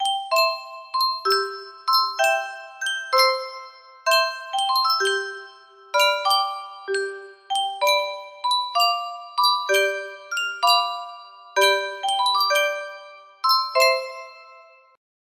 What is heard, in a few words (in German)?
Yunsheng spieluhr